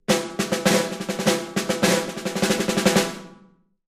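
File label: Drums Snare March Accent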